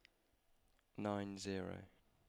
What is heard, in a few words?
train, Shure headset